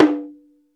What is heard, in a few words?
SIMEDAI, JAPANESE PER; Crypton - DrumBase5000